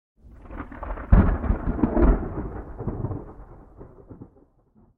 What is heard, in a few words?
thunder clap